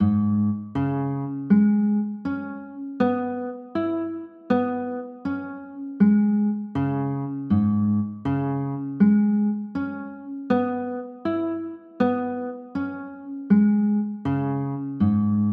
c sharp m7

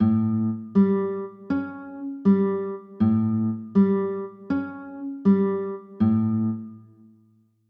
a 6, chords

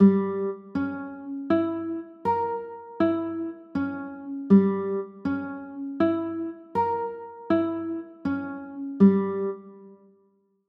g dim, chords; g dim7

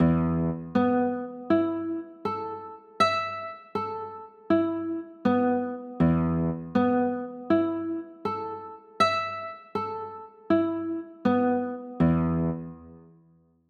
e sus4, chords

